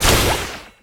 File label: Enemy Wood Poisonball Delete